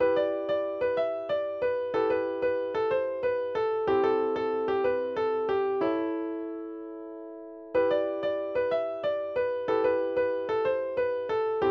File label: instrumental